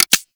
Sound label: bow Mech; Warframe; Weapons; Tenno; CYXbow